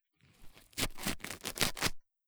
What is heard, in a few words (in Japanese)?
びりりー, 効果音